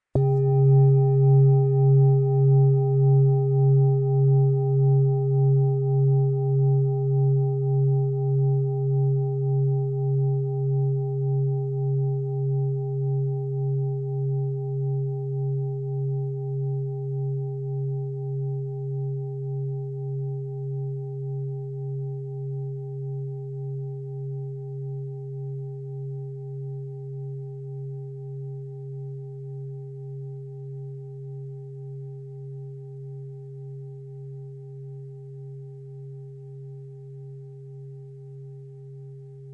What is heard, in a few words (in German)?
Chakra Klang OM